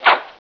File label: SWAP; Zoemtonen hisses pingen woesjes tikjes